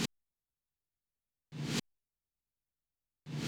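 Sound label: REVERSE snare drum, Techno 138 BPM; Best Service ProSamples vol.54; AKAI; CHICAGO 5 O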